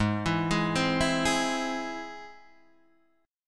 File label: Ab Augmented Ab Guitar Open D